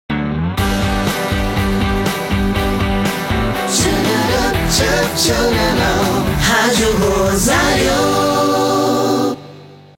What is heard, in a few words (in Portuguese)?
VH JINGLE RADIO MEDIA; shopping; ROSARIO